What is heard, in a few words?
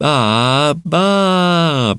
"ah ba"; Voice; ooh ahh